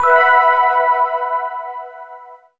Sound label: press start